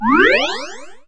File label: Recover; easyrpg; Ib